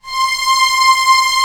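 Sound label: STR C0 FF, strings; Sound On Sound - Peter Siedleczek's Orchestra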